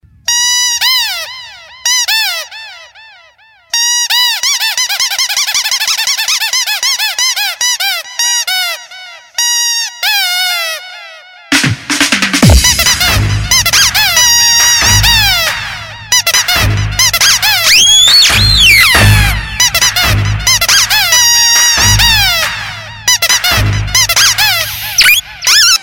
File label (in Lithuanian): squeeze, telefono melodijos